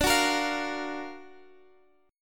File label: Gb Augmented Major 7th D